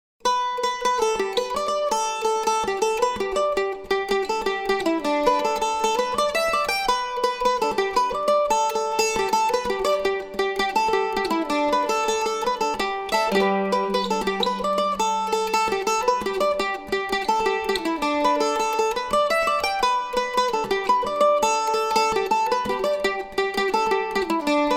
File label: mandolin